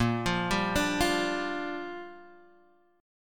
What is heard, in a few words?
A sharp 6th, guitar chord